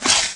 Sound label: Arachnid Attack Swipe, Aliens